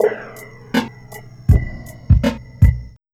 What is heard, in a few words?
Subtle Bumpers, Fx; Sonic Foundry (Sony Creative Software); Esoterik Beatz